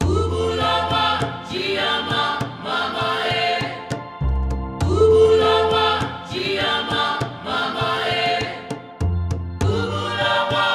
african voices

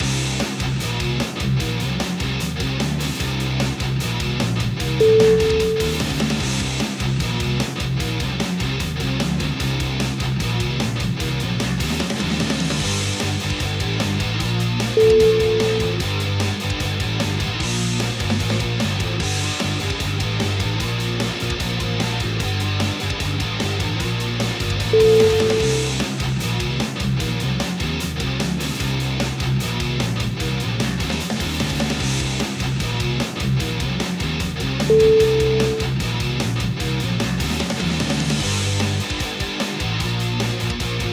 driving metal power

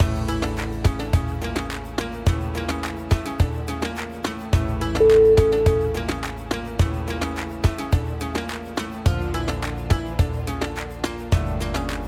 arab travel

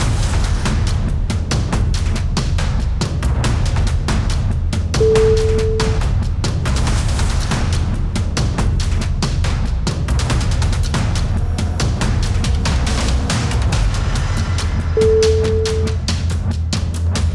stomp

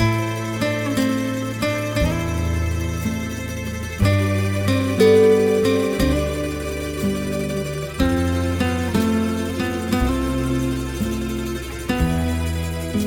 an italian romance

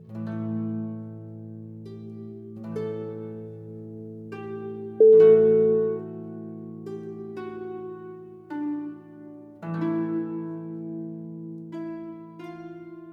harp nocturne

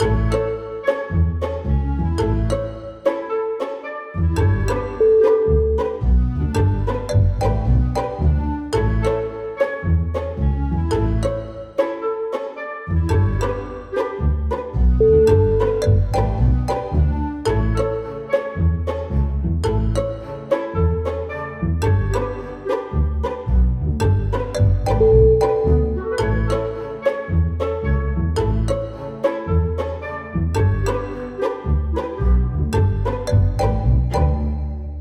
quirky habits

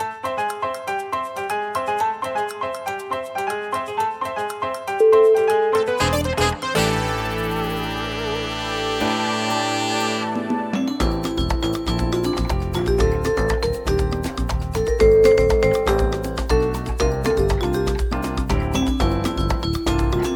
jazzy samba

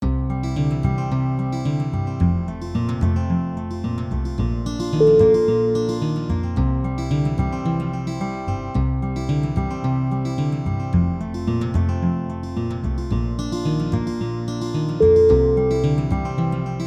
rapid stringing

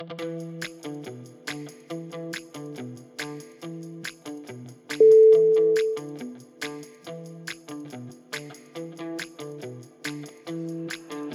retro advert

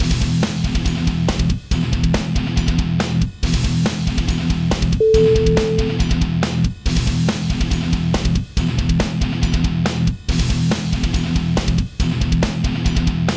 bad attitude

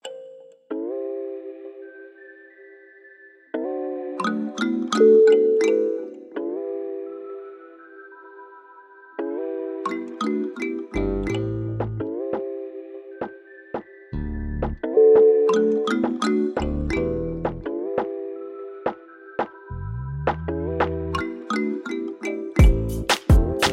romantic beach summer

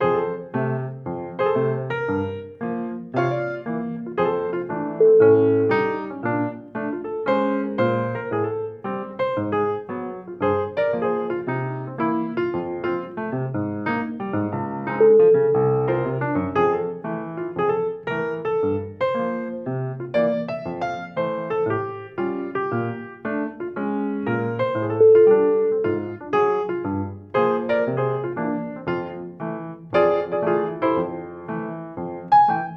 old funny piano

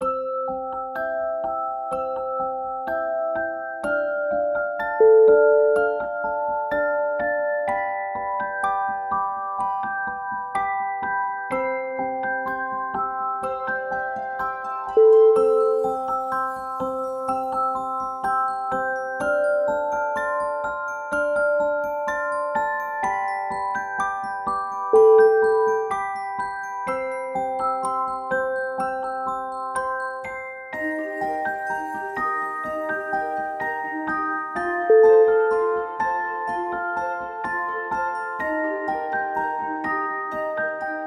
babys lullaby

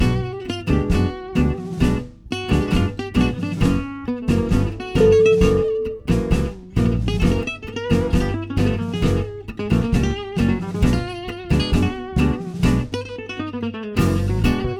gypsy italian tango

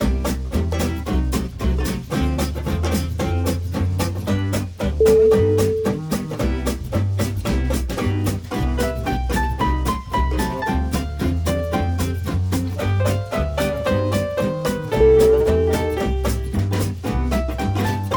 at the italian restaurant

